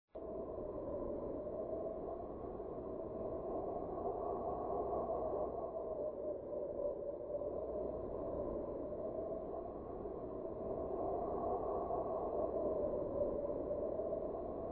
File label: wind